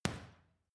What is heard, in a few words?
boxing punching bag side hook medium; sport freizeit; boxen